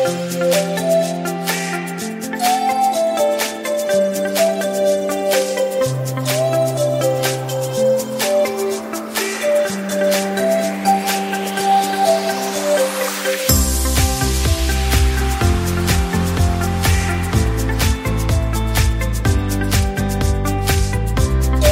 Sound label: Vivo Ringtone; Vivo iQOO Z7 (China)